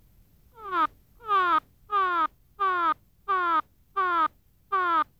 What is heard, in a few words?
Vanduzea, treehoppers; Cocroft